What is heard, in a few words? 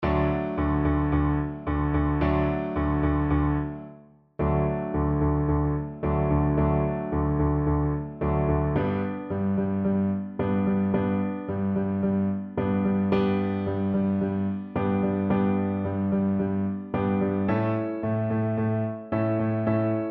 piano backing track